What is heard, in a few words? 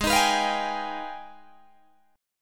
Ab Minor Major 13th, down, Guitar, DADGAD